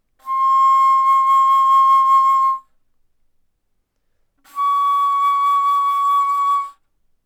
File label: Bass Flute C6 Db6